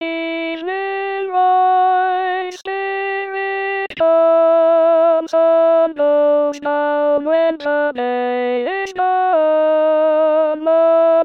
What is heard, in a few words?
Alto